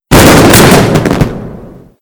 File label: fireworks spark; tf